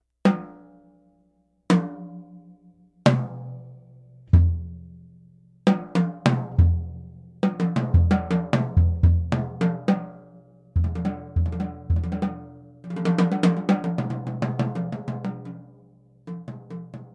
Gretsch Brooklyn

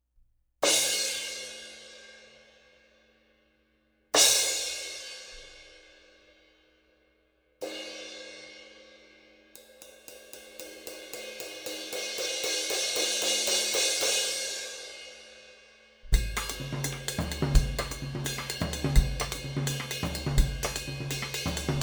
Sabian Proto AA

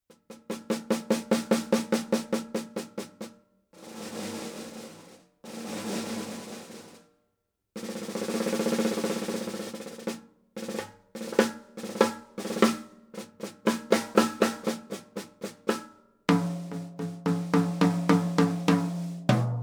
Copper

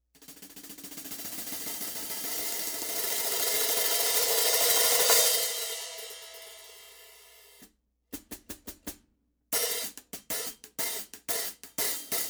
14 A Z New Beats, Zildjian

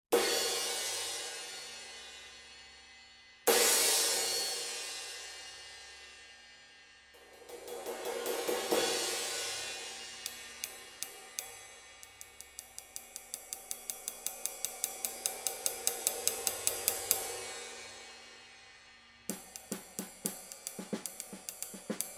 20 K Flat, Zildjian

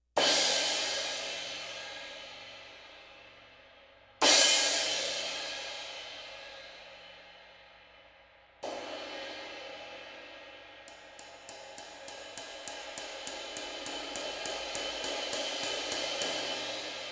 22 K Light, Zildjian

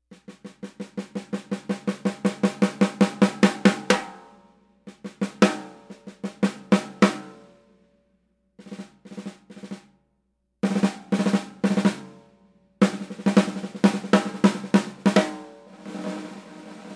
Walnut; Drummers World; 65x14

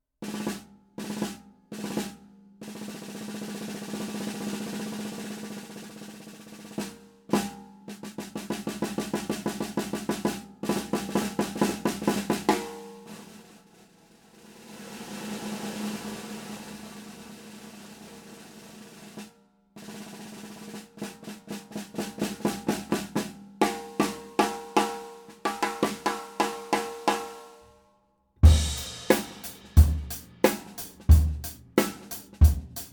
Hybrid Maple Walnut Cherry, 5.5x14 Hybrid; 09 NAMM

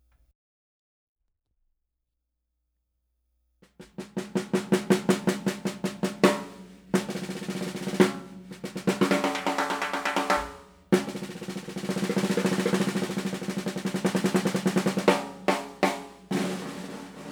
5x14 Solid Duco; Leedy

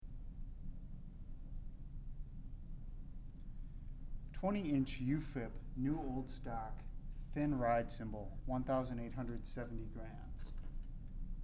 UFIP NOS Thin Ride

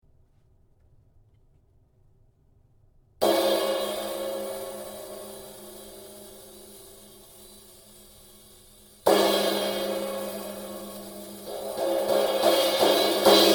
Zildjian K Custom 20

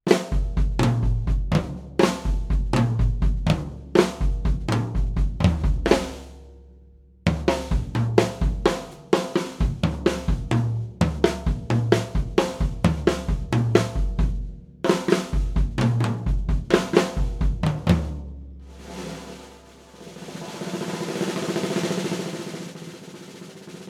gretsch New black

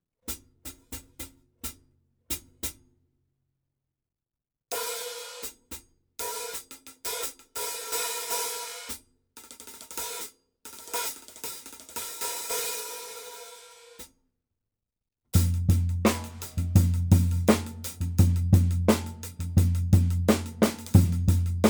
14 Paiste Sound Edge

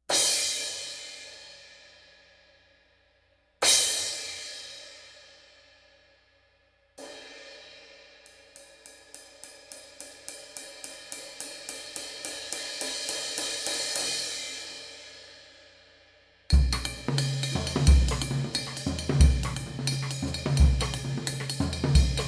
16 K Con, Zildjian

